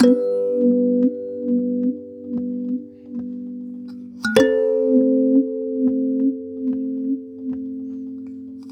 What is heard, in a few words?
Kalimba, African